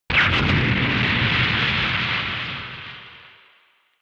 Retro Anime Explosion High Long

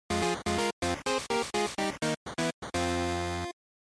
GB Fighting Start

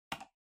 PC-Mouse (Far-C-Hard)

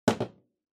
Power Strip Put

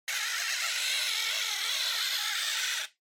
Outlet Timer Dial; コンセントタイマー02-06(ダイアル); タイマー機器

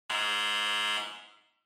Electromagnetic Buzzer Hall

